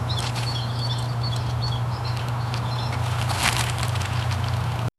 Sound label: gentle rustling leaves di